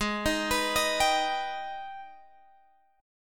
G sharp Minor Major 7th G sharp down Guitar Drop D